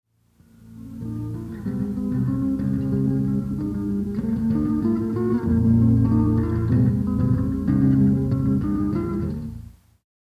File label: bass